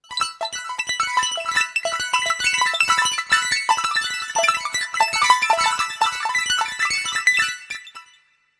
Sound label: ringing gems; ZTE Open C; ringtones